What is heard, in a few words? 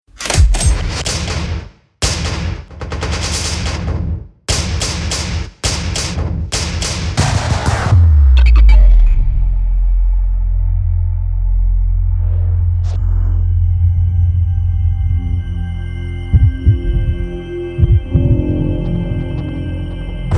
trailer sfx sound design; Giorgio Riolo